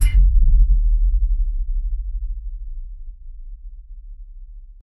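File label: cinematic sub hit with deep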